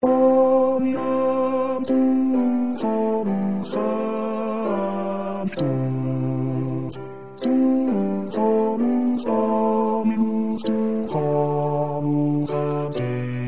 bass gloria

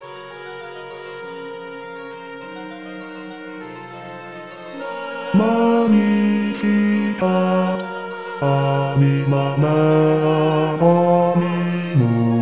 bass; bach magni

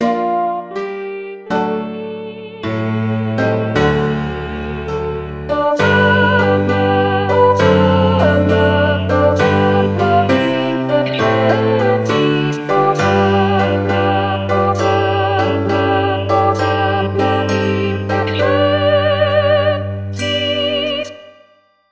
sop benedictus

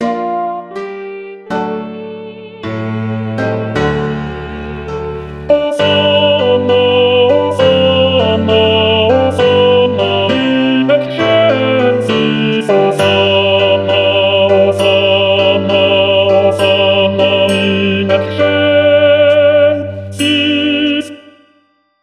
ten benedictus

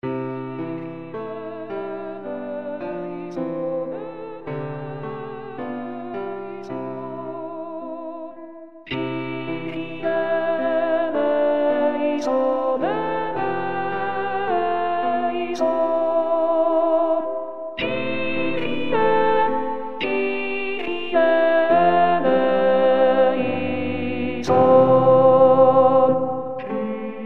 alt kyrie; gounod

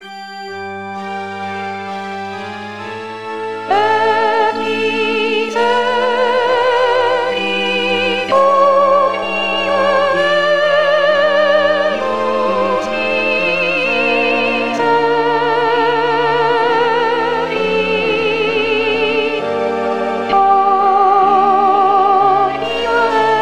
sop et misericor

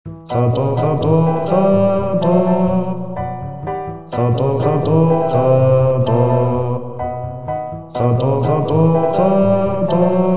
sanctus ten bass

